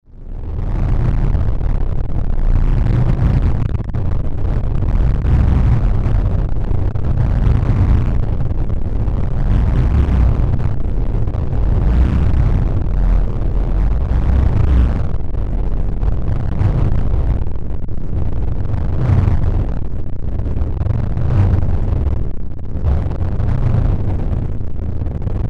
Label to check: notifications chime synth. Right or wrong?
Wrong